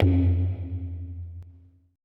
E TABLA F#; ETH DRUMS

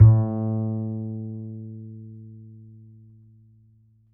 A, upright acoustic bass, A string

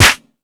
Dance, Drum Machines

